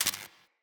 Shield Orb Zap

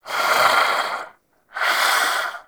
Vocal Breathe; Warframe; Duviri; Dax

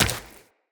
Run Flesh, Footsteps; Tenno

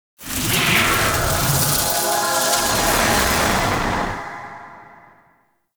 Kuva Lich Death Rise; Abilities

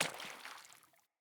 Sandals Run Water, Footsteps; Player

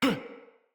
Pain, Battle Barks; Envoy

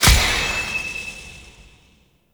Decode Destroyed; Warframe; Emissary Season Two

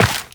Step Dirt, Footsteps, Walk; Warframe; Tenno

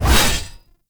Blade Swipe; melee; weapons; Warframe; Dagath Blade And Whip

